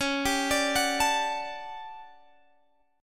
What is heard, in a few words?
A 13th Db, guitar chord